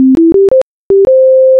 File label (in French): succes; Gravity